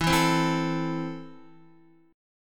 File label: E Suspended 4th Sharp 5th E, down, Guitar; strummed; 4F,4A,5C,5G,5C,6E; JGuitar